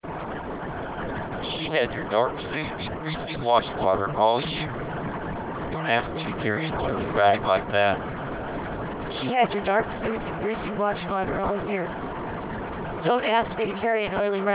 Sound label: car, noise